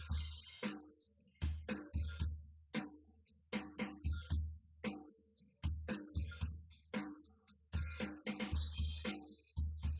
wdrums synthconv; SiSEC08; SiSEC underdetermined

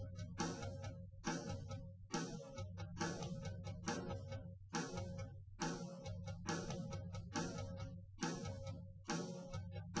wdrums liverec 250ms 1m

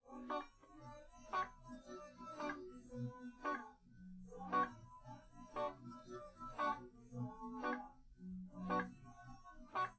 no drums live rec; SiSEC10; underdetermined

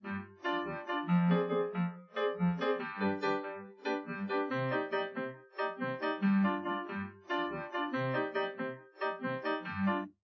drums; SiSEC10; underdetermined

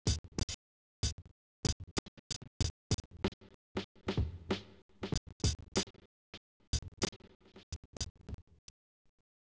wdrums inst sim; SASSEC07

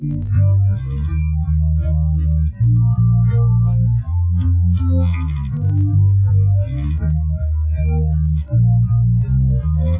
no drums inst src; SiSEC11; underdetermined

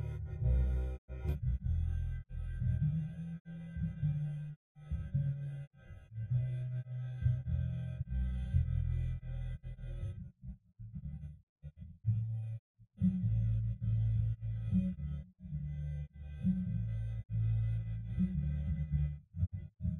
Bass; ICASSP11

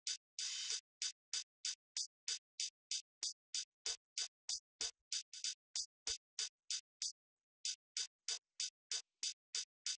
wdrums inst sim; SiSEC08; SiSEC underdetermined

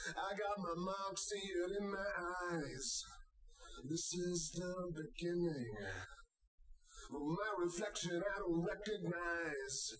test w drums live rec, underdetermined; SiSEC10

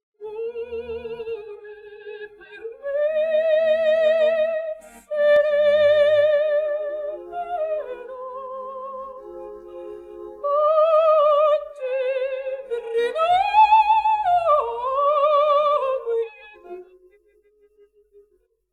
Voice; ICASSP11

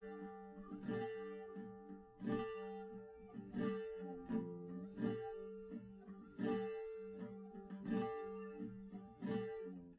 nodrums liverec 250ms 5cm

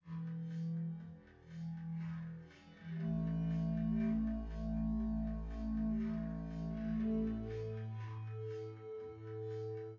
wdrums

